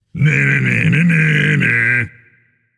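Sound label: non-verbal tongue; smite; atlas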